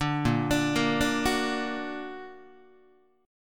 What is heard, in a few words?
A sharp Augmented A sharp, Guitar; A#+ arpeggiated; 4A,4E,5A,5D,5G#,6C#; guitar chord